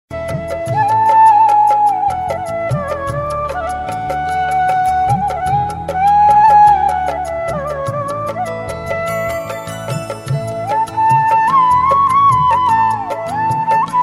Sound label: Instrumental